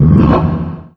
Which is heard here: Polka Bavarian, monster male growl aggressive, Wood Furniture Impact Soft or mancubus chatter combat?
mancubus chatter combat